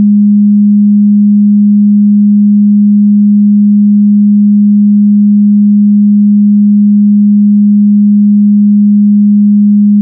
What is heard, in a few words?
beats; sounds With Dif Freq; KundtTube